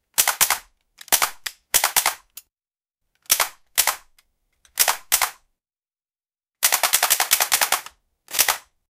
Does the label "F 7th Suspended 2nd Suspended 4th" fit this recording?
No